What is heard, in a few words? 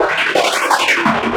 Processed Perc